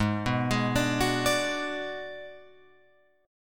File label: G sharp Minor 7th Flat 5th Guitar Open F, G#m7b5 chord; arpeggiated; 8,6,8,9,9,9; JGuitar